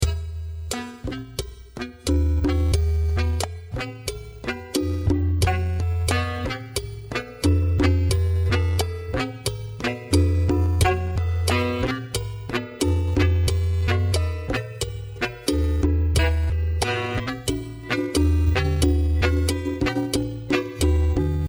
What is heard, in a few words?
loop mono